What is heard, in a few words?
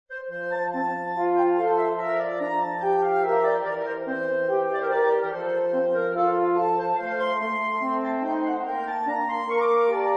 Quartet; Catel Quartet